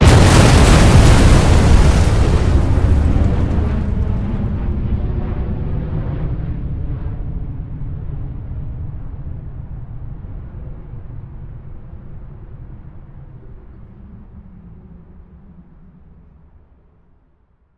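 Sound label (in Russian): взрыв с улетающим вдаль самолетом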